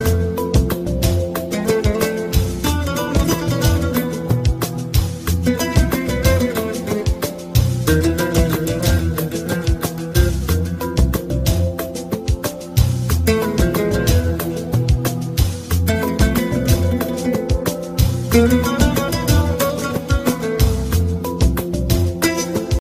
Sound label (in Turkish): romantic turk mix melodiler